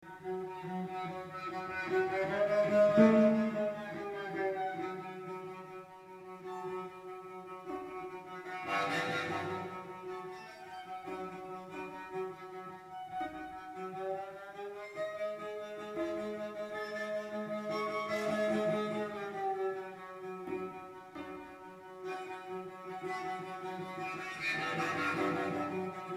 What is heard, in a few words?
Cadenza